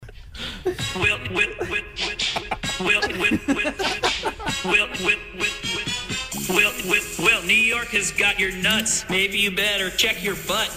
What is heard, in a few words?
rap